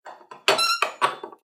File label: Squeaking; soundscrate